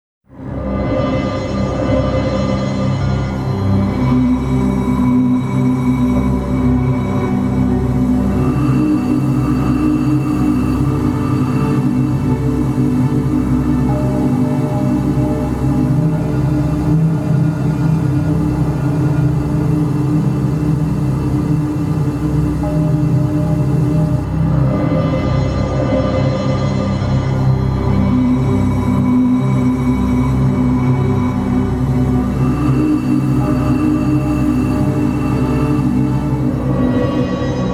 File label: Drone